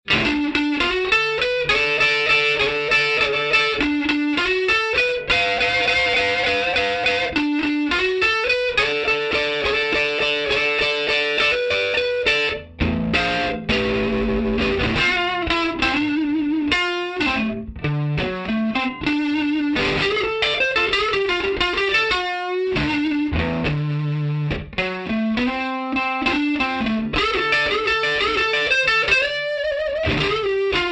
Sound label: Bohemia Solo 66 AC Hi Boost with 2x12 AC Blue and Dyn 7